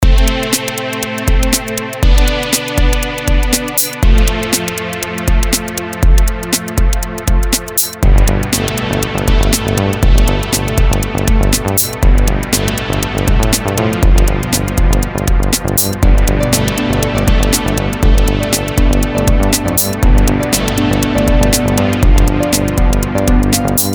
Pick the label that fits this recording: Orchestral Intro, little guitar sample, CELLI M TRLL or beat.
beat